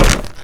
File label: fall death; Dystopia